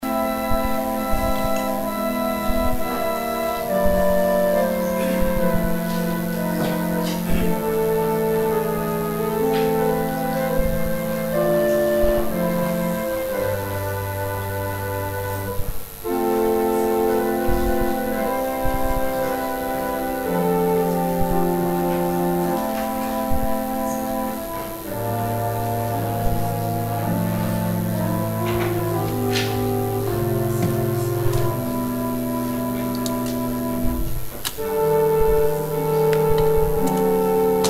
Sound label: organ interlude